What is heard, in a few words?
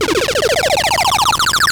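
high score; robotron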